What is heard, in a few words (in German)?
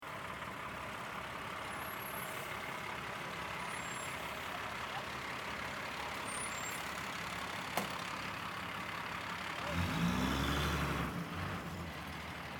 Silvester Feuerwehr